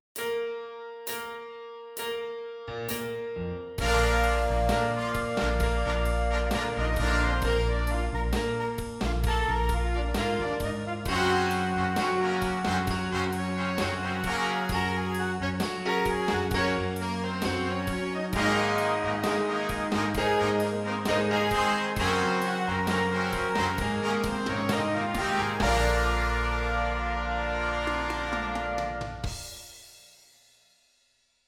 Horns in Fifths; Exercise 3

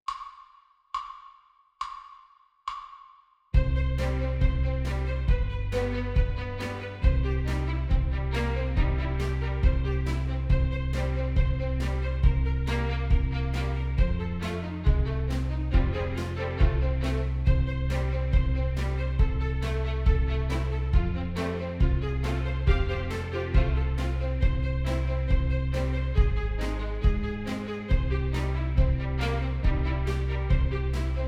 Basic Daily Warm up A Horns in Fifths

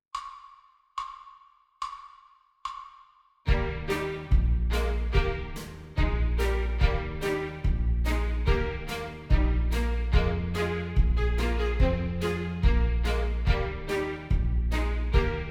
Rhythm Study

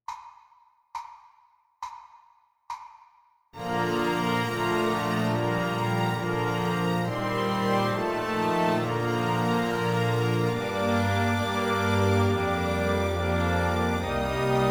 Basic Daily Warm up E

